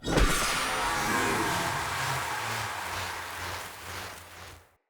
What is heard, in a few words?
Energy Blade Projectile By; Weapons; Swords